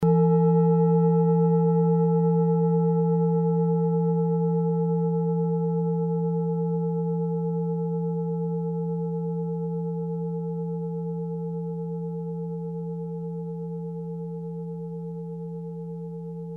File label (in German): klangschale orissa